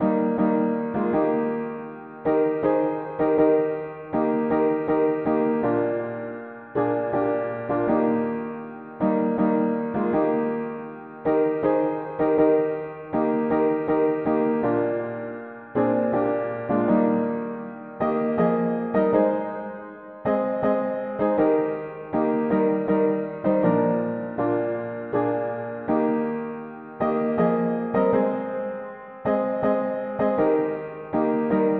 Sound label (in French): L amour de Dieu mono piano